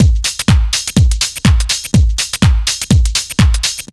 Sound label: house loop